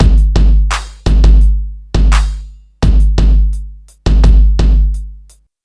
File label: 85 bpm high quality drum beat g sharp key; drum loops